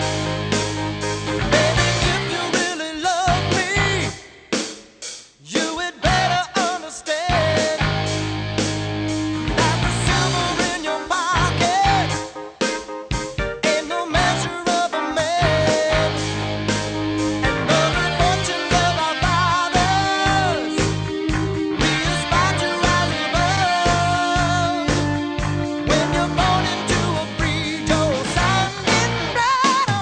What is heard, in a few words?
rock